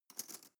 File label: cutlery teaspoon drawer place soft